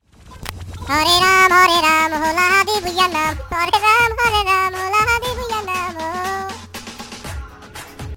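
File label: Bangla Funny Ringtone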